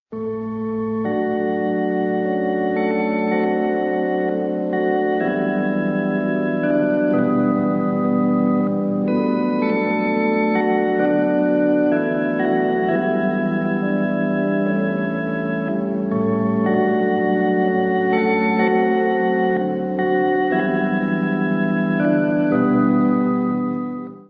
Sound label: river song harmonium; chinla